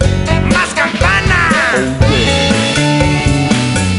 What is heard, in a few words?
Cowbell; gamerip; Happy Feet Two; nds